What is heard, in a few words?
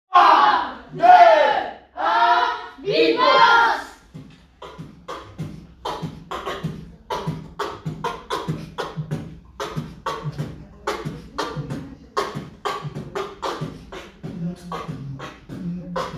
Beatbox